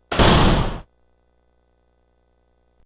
A locker door is slammed shut with room reverb.; Door Slams

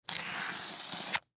Pill Packet Sheet Slide Scrape Counter Top; Foley; Sound Effects:Objects; SoundEffects-Blastwave

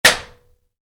Wood hit aluminium, Wood, Light Impact: Aluminum; Materials; Stuart Duffield

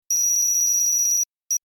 Beeps Data Transmission Technology

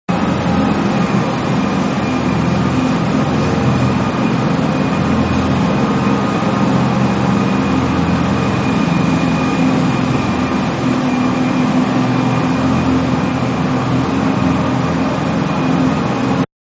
Ice Hockey Zamboni passes by from left to right; Manhattan Production Music

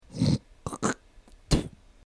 Revolting Spit Spitting

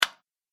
Light Switch Positive Click; Switch Sound Effects

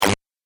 Laser Punctuation Mark

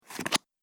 Cards Card Flick; Card Sound Effects; casino

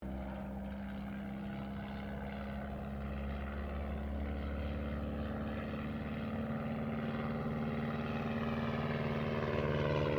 AIRCRAFT DH82A DEHAVILAND TIGERMOTH PASS; RAAF Tigermoth; Airplanes (Sound Effects:Vehicles)

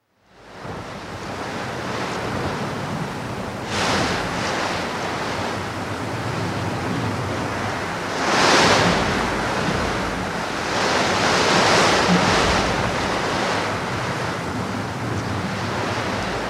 Gusty wind in bushes; BBC

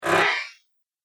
Robot Spring Sound, sci-fi robot sound effect; Andrew Fleming; Twistedtracks

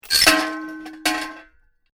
Metal Junk With Chain Clanks Rattle; Metal pipe with chain attached rattles and drops; Metal Sound Effects; Lynne Music

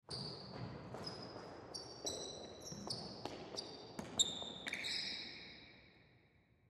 Basketball, Basketball Practice Gym Single Person; Run Scuff Floor; Basketball Sound Effects; Blastwave

